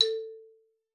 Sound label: A3, xylophone; Xsample VOL-4